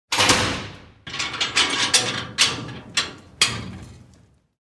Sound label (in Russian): vyshli iz kamery i zakryli dver; звуки тюрьмы